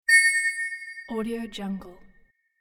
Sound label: ding